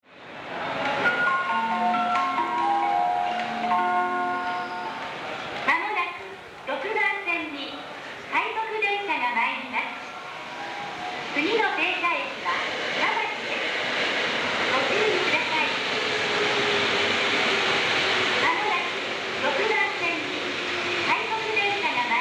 tokyo, station, yamanote; 6番線（京浜南）接近放送; （快速運転時）; jreast

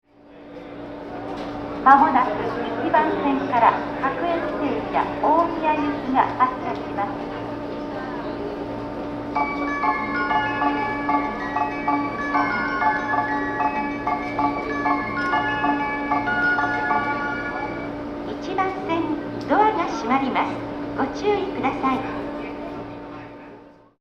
melody

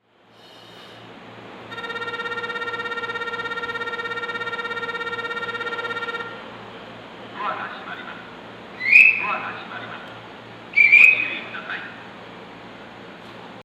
bell

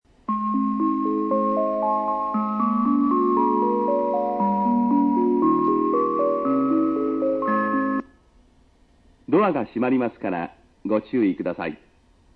melody, station

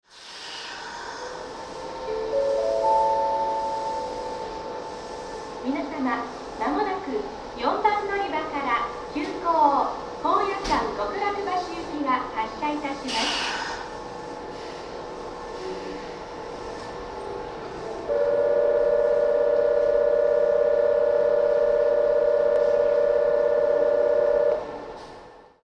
bell, station; nankai; namba

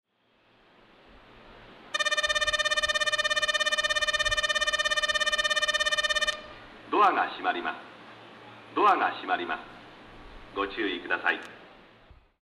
bell, station

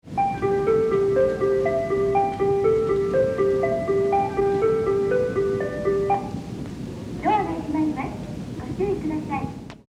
tamachi melody; 2番線（山手内）発車メロディ; jreast; yamanote

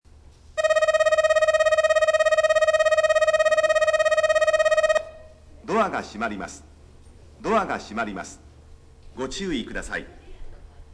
bell, osakaloop

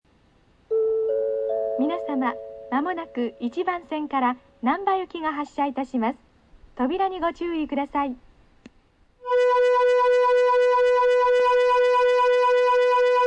bell; 1番線（上り）発車放送＋出発時機合図; nankai; misakikoen

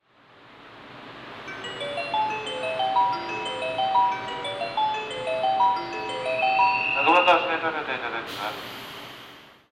melody; takadanobaba; 6番線発車メロディ; seibu

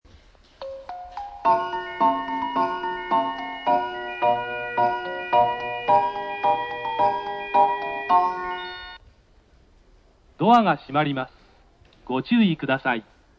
melody, station

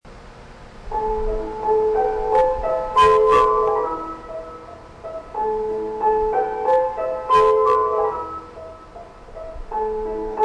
melody

